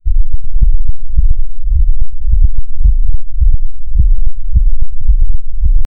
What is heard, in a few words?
heartbeat slow; sound effect